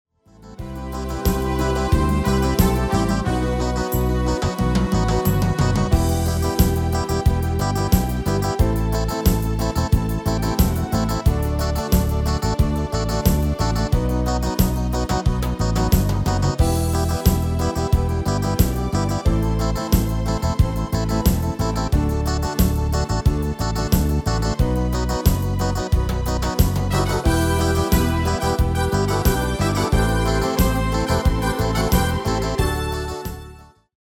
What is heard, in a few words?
MIDI